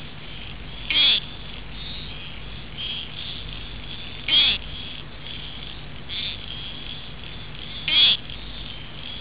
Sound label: east froglet